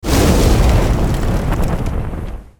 burning, lava; cauldron frozen lava pack; LAVACAUL

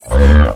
pain, procoptodon; zoochosis pack

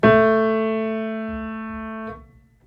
key, pianosaurus

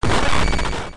phase 1; Garry's Mod; vinagre npc pack 1

